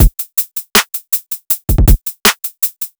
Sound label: phonk drum loop; phonk toolkit; rocket powered sound